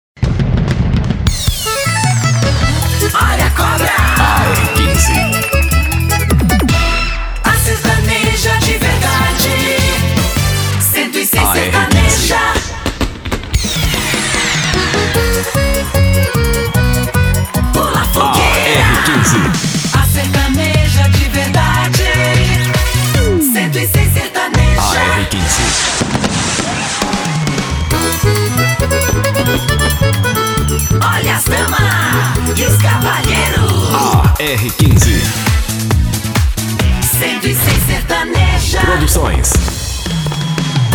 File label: Up beat sertaneja